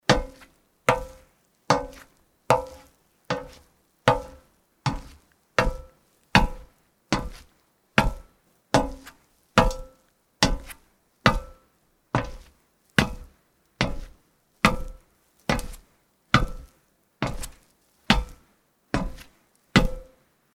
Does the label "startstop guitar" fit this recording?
No